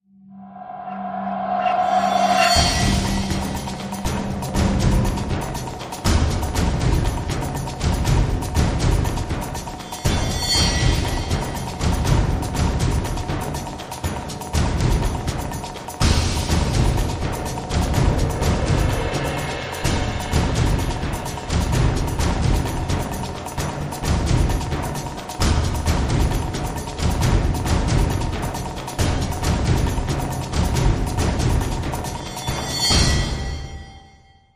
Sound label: Drum Cue